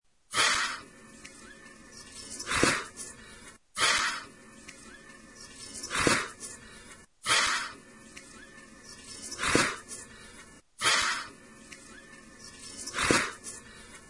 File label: Gila Monster; Jeff Rice; Univ Utah